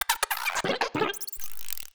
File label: Tiny Creature Alien, Monster Sounds; Monster Sounds Volume II